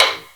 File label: taiko soft hit whistle; Moyai Balls